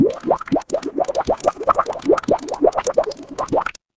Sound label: water bubble